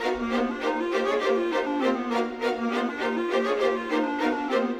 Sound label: Modern Orchestra; orchestral 2